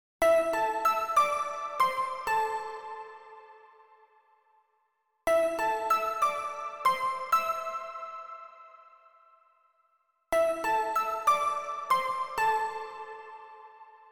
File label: Pluck Melody; super crush k.o.